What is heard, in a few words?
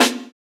Snare, JUNGLE BREAKS